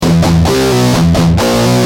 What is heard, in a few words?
G Jam, Guitar; Industrial